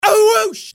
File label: A whoosh